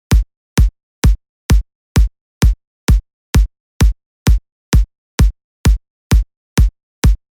kick

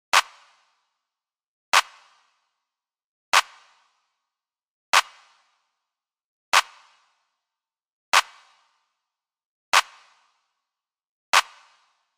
Clap

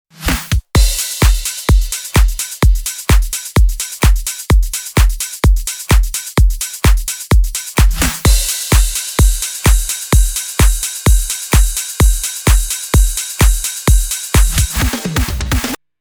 Drum Full

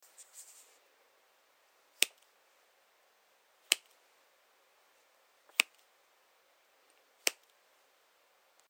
Snap EQ

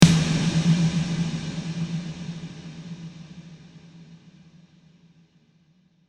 Snare wet big

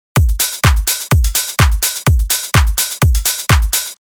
Dubstep Loop

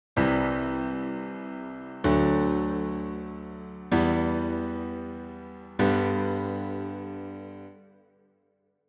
piano

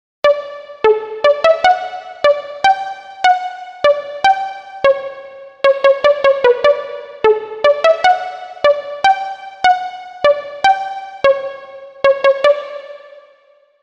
Pluck Chamber

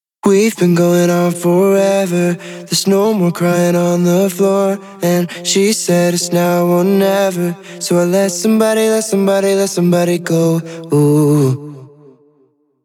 synced delay

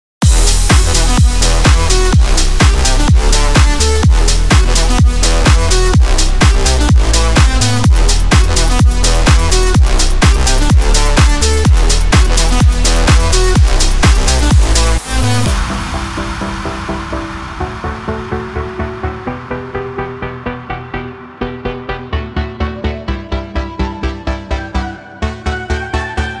Drop Break

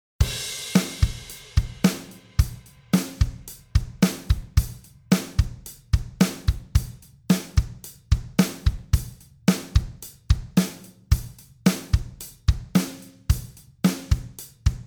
Drummer; Defo

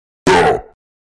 Vocal

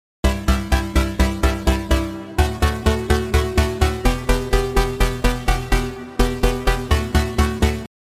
break chords

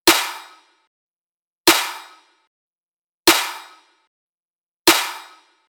Snare B

